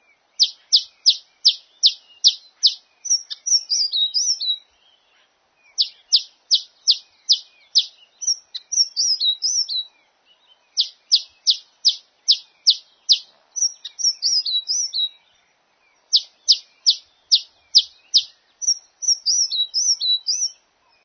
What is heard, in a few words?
Malachite Sunbird; birdlife